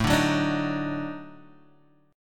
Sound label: Eb Minor Major 7th Flat 5th